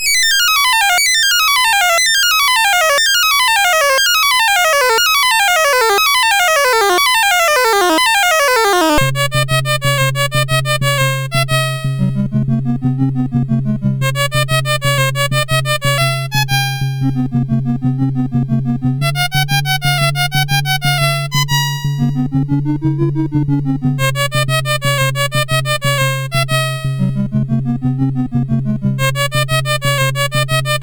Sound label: sid MOS6581R4, GAMES; Accolades Comics